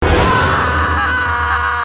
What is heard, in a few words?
marshmallow scream